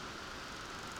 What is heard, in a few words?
Background Noise